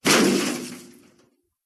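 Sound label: second variation of first car crash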